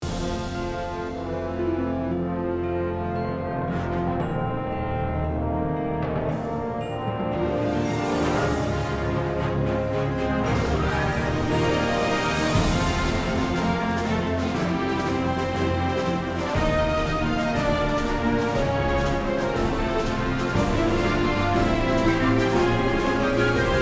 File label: lobby